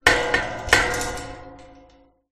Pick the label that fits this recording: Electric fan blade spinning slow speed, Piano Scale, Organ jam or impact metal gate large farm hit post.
impact metal gate large farm hit post